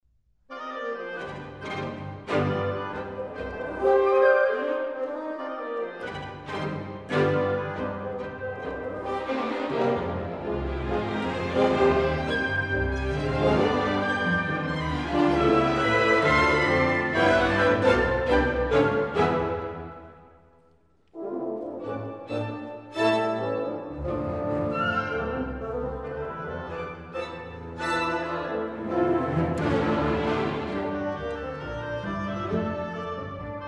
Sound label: Tango